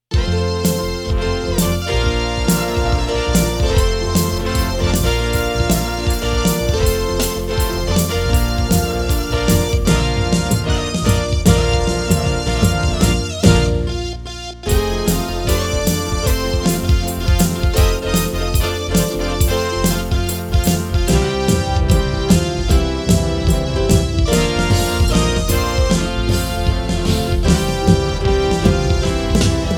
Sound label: drums